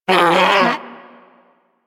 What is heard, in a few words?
Predator Vocal Pain, Hunting Critters; Warframe